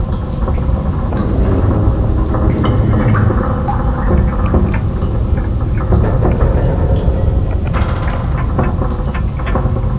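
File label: ambience creepy tunnel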